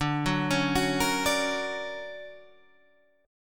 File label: D Augmented Major 7th down Guitar Open G